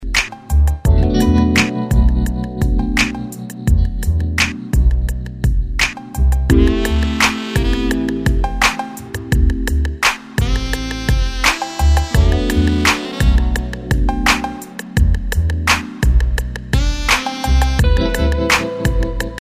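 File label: 85 lento free music; background music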